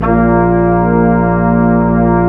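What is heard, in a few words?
brass quintet; Roland L-CDX-03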